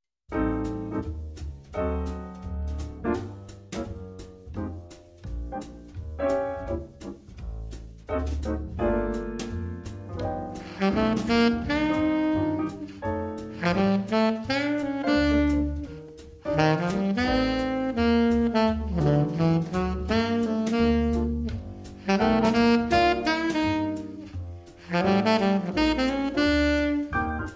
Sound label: Alto Sax